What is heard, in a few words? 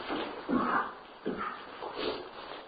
investigator cough; paranormal evidence